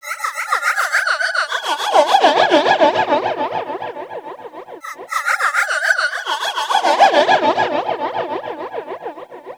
GROOVY; USB Soundscan vol.33; AKAI; Disjointed Textures; RYTHME

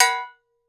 JOPA BELL, Brazil Drum Perc; E-MU Producer Series Vol. 7 – Old World Instruments

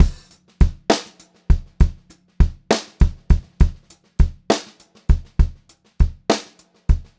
Pop-Rock Drumloops, 100

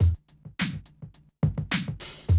Classic DrumLoops

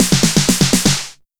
Tuned Drum Fill; Sonic Foundry (Sony Creative Software); Electronic Point Blank

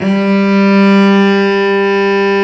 STR Vc Arco p

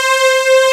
SYNTH STRING

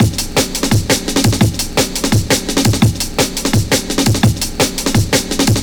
Some Drum, Drum & Bass; Fluid Dynamics

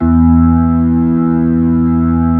B-3, Full Xfade; Roland LCDP10 Keys of the 60s and 70s 2

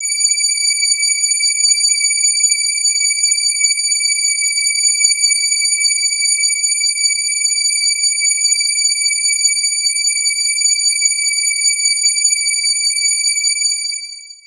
Hi Key String; Strings & Pads; Zero-G Sound Sense Grime Scene; 90 s Sample CDs

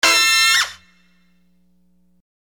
Horn Loop, Hip-Hop; Construction Kit; Sonic Foundry (Sony Creative Software)

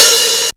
k1, Kawai K1 Drum Machines; 300 Drum Machines

